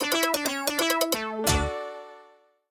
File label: outro Free Spin; fruits